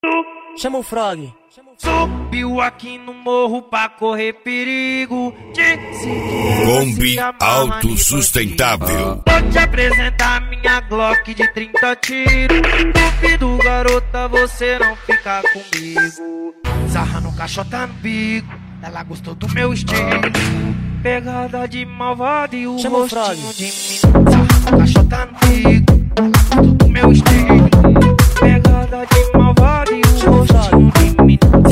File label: MEGAFUNK E PANCADAO